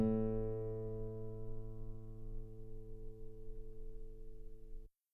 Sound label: guitar A2 very long piano normal; bananenkeyboard